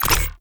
Mech Aim Stop; Nechro Mech

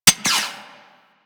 Sniper Mech; Warframe; Tenno

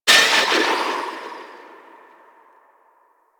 Dex Furis Tail A; Tenno

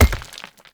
Hammer Impact Rock; Weapon Impacts; Player; Environment; Tuvalkane Hammer

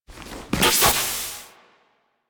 Navigation Activate; Warframe; Liset; Hub; Machines; Tenno Navigation

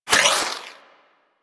Wall Hand Slide; Footsteps; Warframe; Tenno; Wall Run

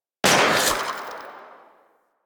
Crossbow Whoosh, weapons; Garuda

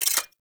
Pistol Mode Switch Enter, weapons; Warframe; Thanotech

